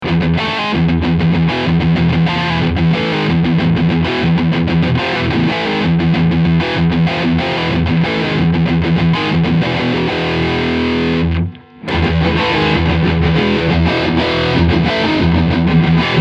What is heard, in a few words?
Metal Dubs